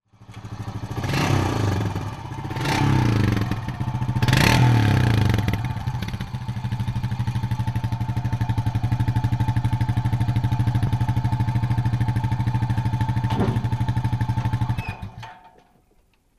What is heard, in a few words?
atv quad bike revs and moves and stops